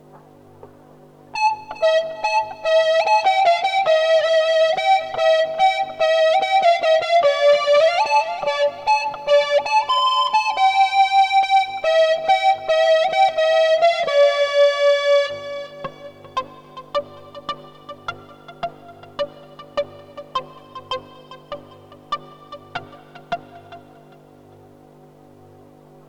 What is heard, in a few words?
soprano gt lead